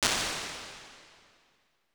Recital Verb, Impulse Responses; Medium Spaces; Indoor Spaces; Apple

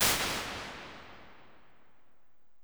Big Chamber, Impulse Responses; Large Spaces; Rooms; Apple

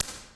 Organ Reflect, impulse responses, warped reverb; Small Spaces; Apple

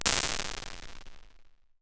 1.8s Big Train Station, Impulse Responses; Medium Spaces; Indoor Spaces; Apple

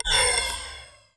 Freezer FX, Impulse Responses, Warped Reverb; Medium Spaces; Apple

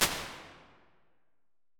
Key Hall, Impulse Responses, Indoor Spaces; Medium Spaces; Apple

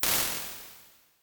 Soft Plate; Medium Spaces; Plate Reverbs; Impulse Responses; Apple

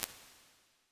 Hallway, Impulse Responses; Medium Spaces; Indoor Spaces; Apple

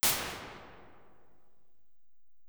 Old Vintage, Impulse Responses, Spring Reverbs; Medium Spaces; Apple

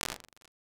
City Street; Small Spaces; Outdoor Spaces; Impulse Responses; Apple

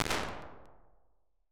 Office Corridor, Impulse Responses; Medium Spaces; Indoor Spaces; Apple

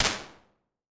Wet Small Room, impulse responses; Apple

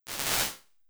Room Reverse; Medium Spaces; Apple

